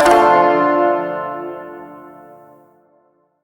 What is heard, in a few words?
Shawzin Maj Chord B; Warframe